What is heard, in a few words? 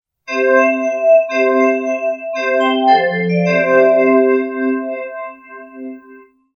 Jingle bells